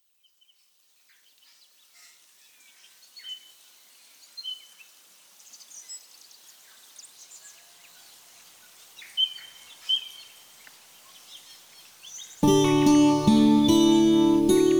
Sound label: vocals; ambiance; music smorgasbord